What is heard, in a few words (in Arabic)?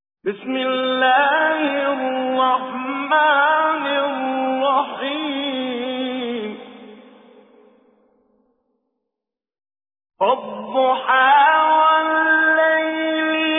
سورة الضحى, تجويد; المقريء الشيخ محمد صديق المنشاوي